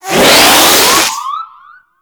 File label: Balloon Inflate; Festivities; Warframe; Follie